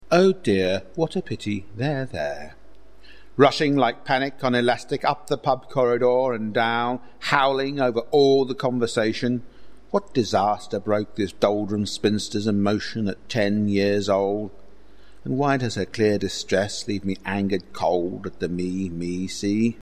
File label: howl